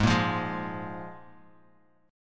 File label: F Minor Double Flat 5th, Ab